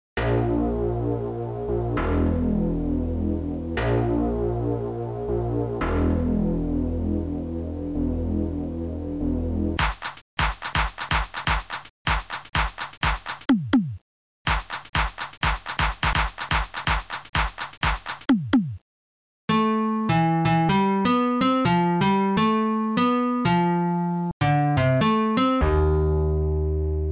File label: fireball